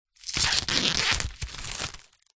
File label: foley plastic cellophane wrapper pull rip remove from tall flat item